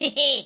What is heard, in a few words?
hehe; jocly